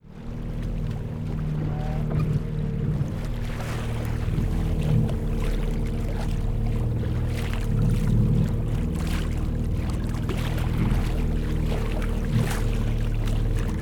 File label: Bow Of Small Motorboat